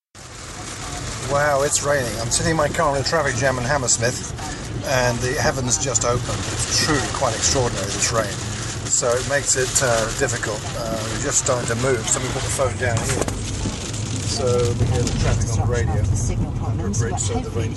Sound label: rain, Hammersmith